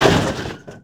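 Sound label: impact metal pole destroy; Cars; XBOX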